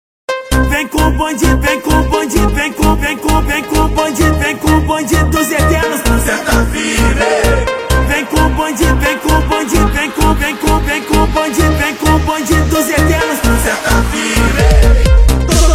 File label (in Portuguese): eletro funk